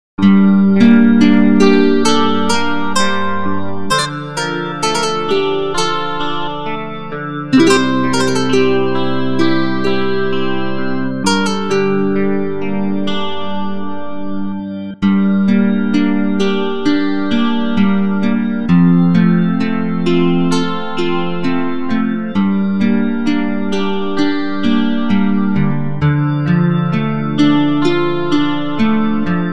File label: Nylon String Guitar, Electric Clean Guitar, Hammond; Hammond B3 Bass; GuitarTempus; Syntheway